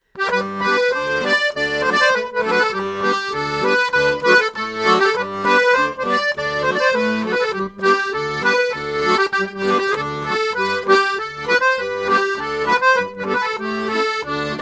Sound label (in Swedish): Dragspel; 16 bit